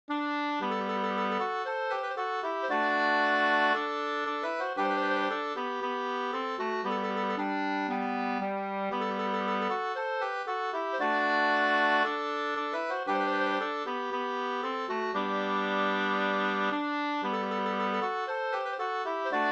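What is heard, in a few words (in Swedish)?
brudmarsch Julita nya brudmarsch 2 stämmor; A Linander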